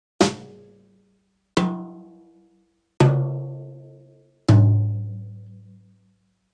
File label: drum set brushes